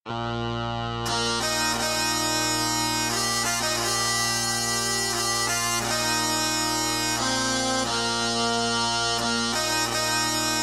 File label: Reverb